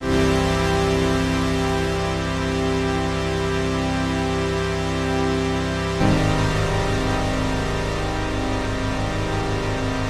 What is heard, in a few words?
fractal sawtooth wave